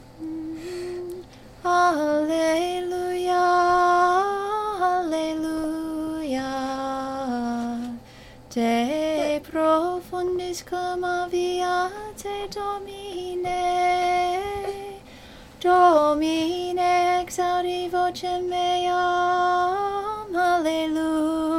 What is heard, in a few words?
psalm tone alleluia